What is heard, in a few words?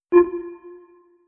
Sonar Radar Ping